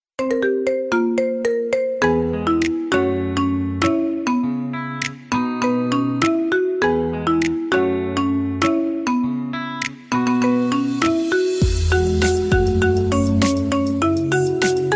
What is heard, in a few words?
marimba mix ringtone